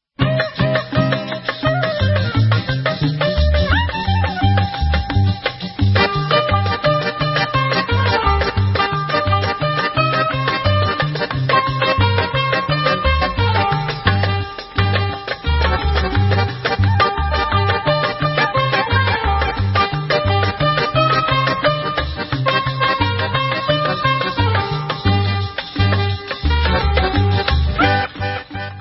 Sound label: Instrumental